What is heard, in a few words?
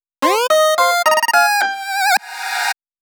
synth cheeky C